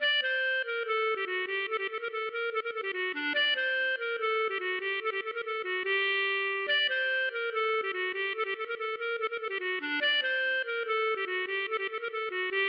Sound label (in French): bourree; Trois Canards